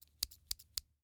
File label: Scissors, snap, snip, shear, small, triple; Eiravaein Works; Vaeyan IV